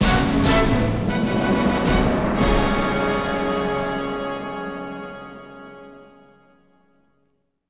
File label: Combat Win; Combat Reg4 Win 02